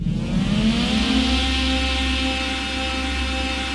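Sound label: THX surround; Pro-Rec - Synthsonic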